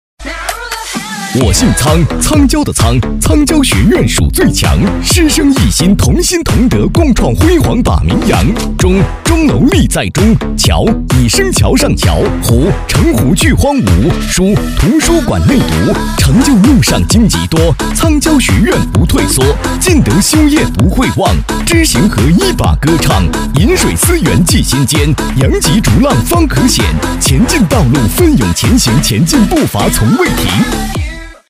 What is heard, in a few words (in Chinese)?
喊麦